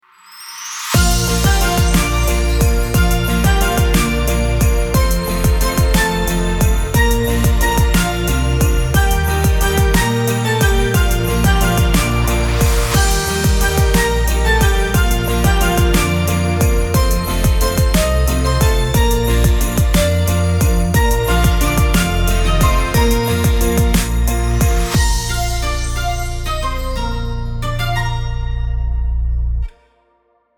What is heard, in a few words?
silent night christmas background music hip hop version